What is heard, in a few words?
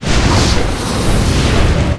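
Watchman Normal Attack Swing; Seer; GamigoNA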